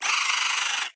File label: Spider Pain; The Inn